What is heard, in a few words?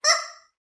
Squeaky Toy; SoundsCrate